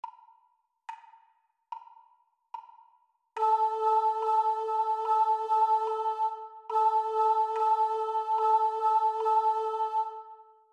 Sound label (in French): Rythme Haut